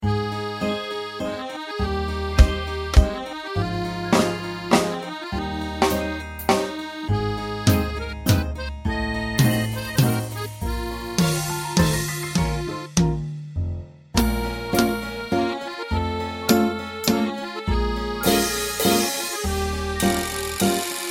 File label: 3/4 waltz